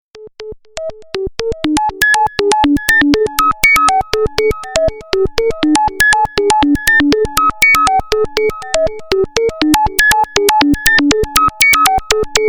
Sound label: Ringtone; Spectralis